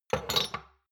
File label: shot glasses place multiple soft; soundpool